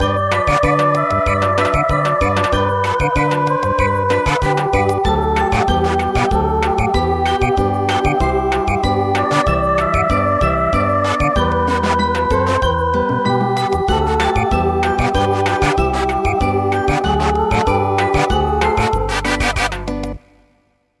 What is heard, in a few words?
Samba; Long I gaze across the snow